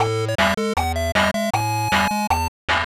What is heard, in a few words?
Game Start; Crazy Climber; Nichibutsu Arcade Classics; SNES